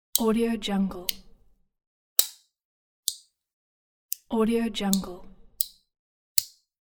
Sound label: plastic buttons